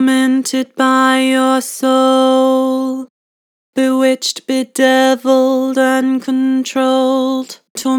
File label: Vibrato, Vocals; Tormented by your soul; Harmor Vocals